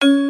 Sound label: step; Magic Idol